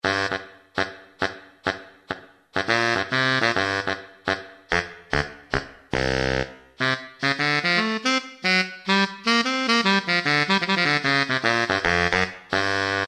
Brite Alto, Alesis QS8; Factory Preset examples

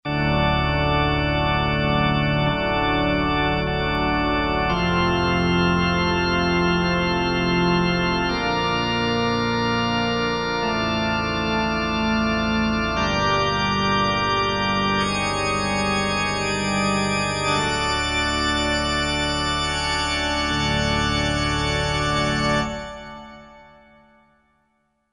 Pipe Organ; Roland PN-D50-01

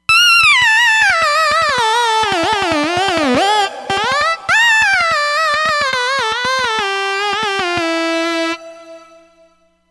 DT Lead, Roland Fantom XR

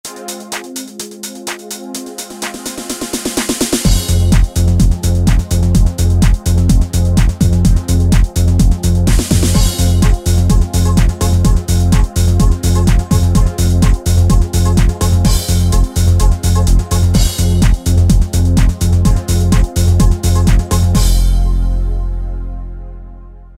Deep, Yamaha DJX Styles

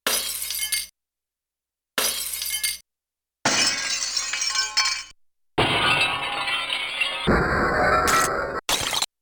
Broken Glass I; Yamaha TX16W; Sound FX

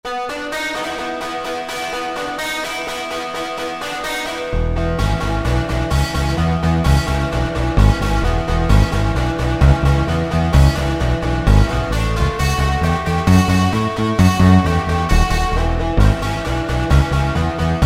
Rock&Roll

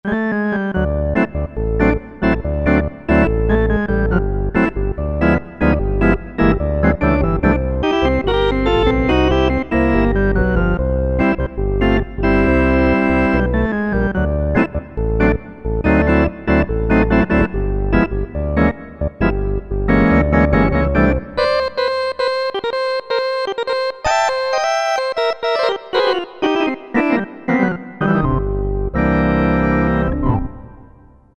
Organ, Cheetah MS6

